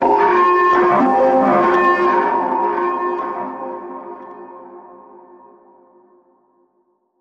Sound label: Raiders Outpost Alarm